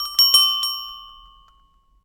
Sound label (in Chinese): 开门不管关; 环境音; 非循环音(SE); Eustia